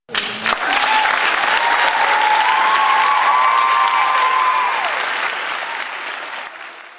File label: applause